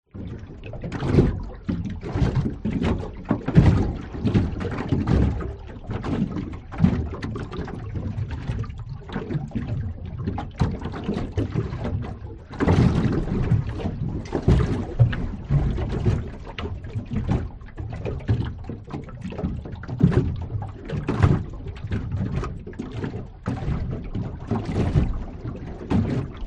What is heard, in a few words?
transport boat floating engine off waves choppy against hull recorded against boat; floor